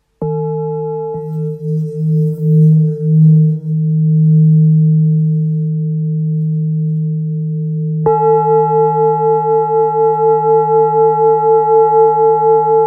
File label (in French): Bol ancien re diese 655g